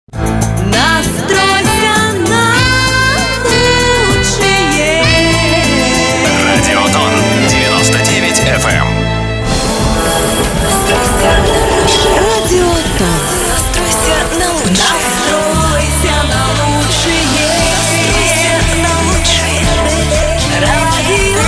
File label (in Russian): Радио Jingle; Радио-ТОН